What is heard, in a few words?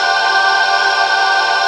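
G4 glass vox; Northstar - The Wizard VOL-1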